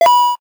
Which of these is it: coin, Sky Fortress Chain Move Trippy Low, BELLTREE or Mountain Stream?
coin